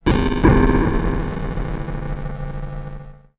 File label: Bit Crush & Moog Delay, Wire & Tin, contact mic; musicradar; contact mic madness